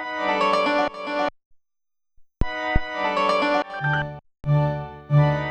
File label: baroque; Polyend; Loops n trouble